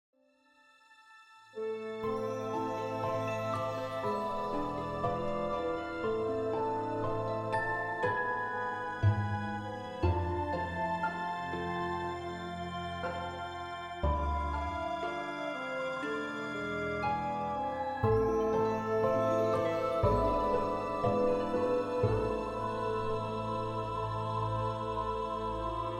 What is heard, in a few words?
Epic adventure epic